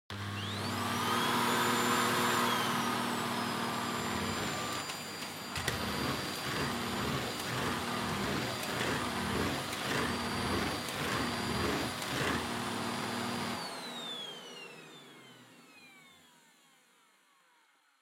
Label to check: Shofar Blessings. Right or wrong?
Wrong